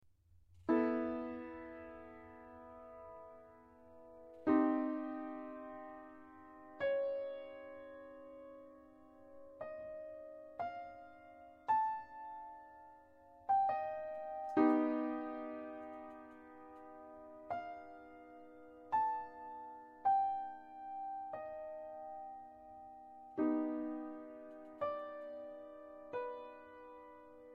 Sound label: rain poem, solo piano; poem about rain